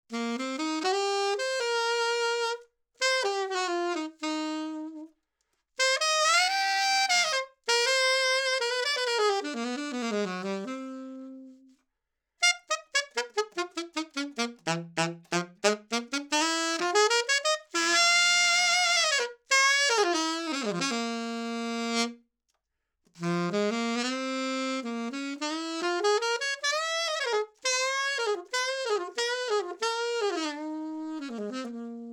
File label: Sax Alto 50cm C Mid